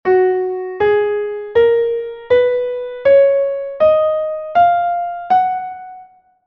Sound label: scale of g flat; Essentials of Music Theory: Elementary